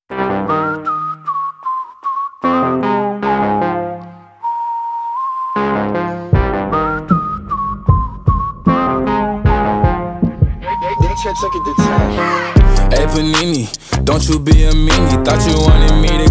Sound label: Remix HipHop Dance